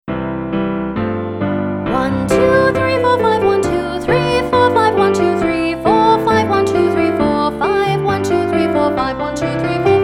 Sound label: Vocal Warm Ups Numbers; Vocal Warm Ups Volume 2